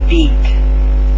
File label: beat